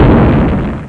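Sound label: the explosion, war; ClickArt 300,000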